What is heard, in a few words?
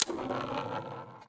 Letter Out Roll; Worms3D